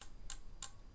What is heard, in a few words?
wheel start